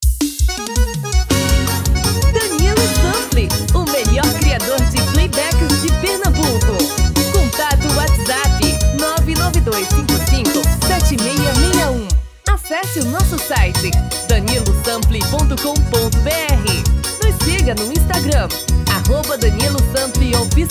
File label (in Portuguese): Arrasta pe tom feminino